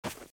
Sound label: grass; alternativaplatform; alternativa3d v5; temple